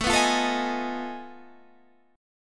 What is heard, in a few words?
F 7th Sharp 9th Flat 5th A